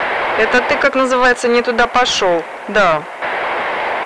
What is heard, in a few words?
radio